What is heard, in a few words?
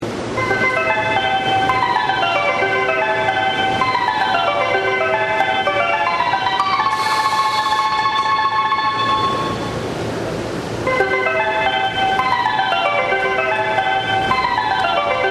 hassya; kozu